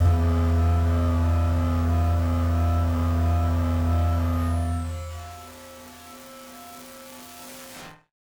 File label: Battered Synth; malfunctioning synth samples